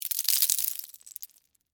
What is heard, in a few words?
Debris Penne Rigate; Texture; Materials; BlastWave